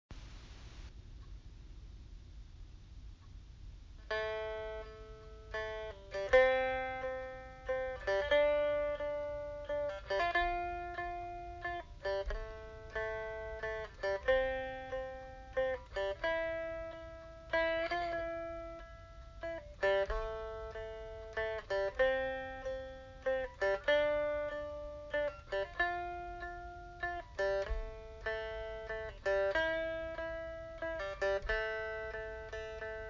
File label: Diddley Bow